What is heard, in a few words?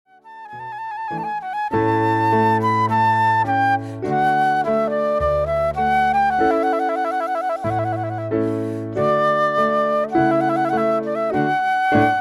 ceremony music, Jazz Trio